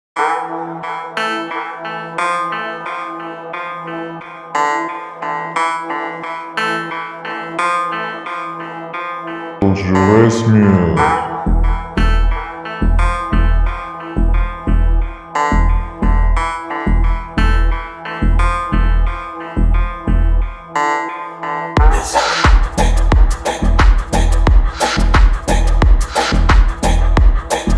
3d slowed bass remix

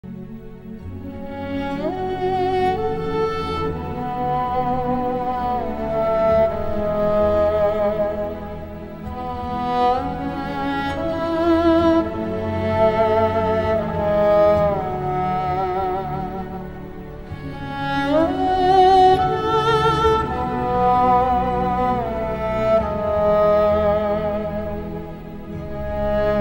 qobyz instrumental cover

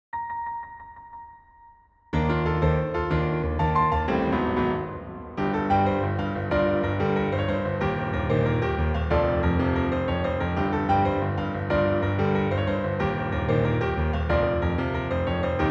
on piano; JUJUTSU KAISEN All Openings 1-4

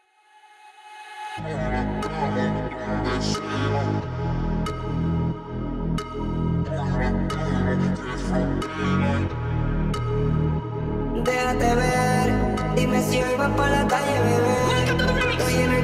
car music

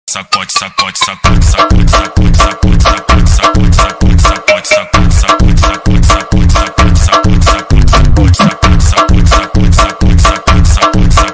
slowed

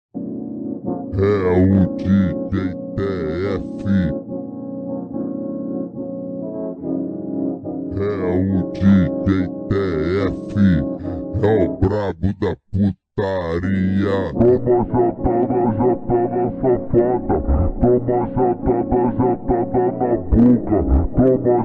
ultra slowed reverb bass boosted

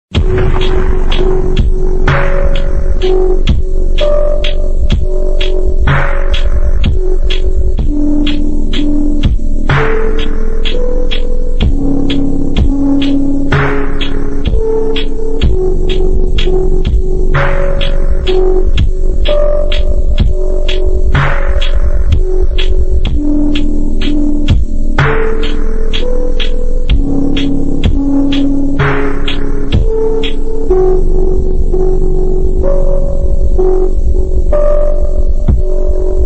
ultra slowed reverb

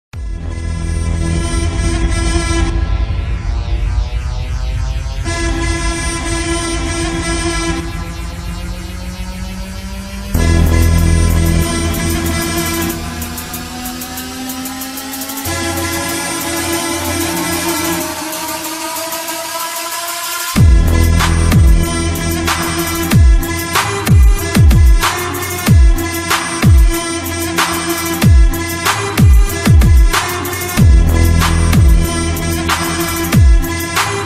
bass trap remix; kurtlar vadsi

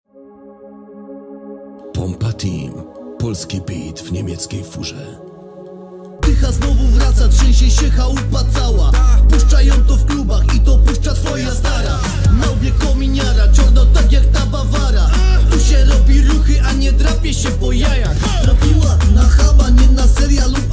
bass boosted

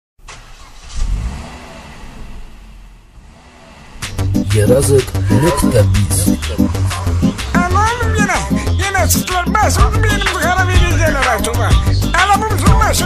azəri bass music; köhne mahnılar

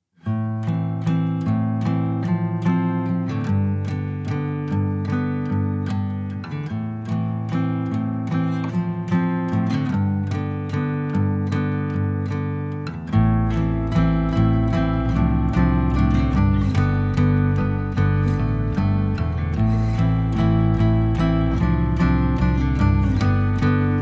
acoustic cover

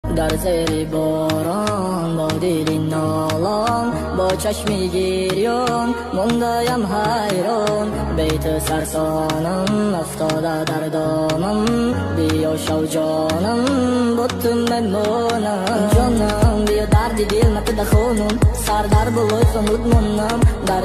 rap

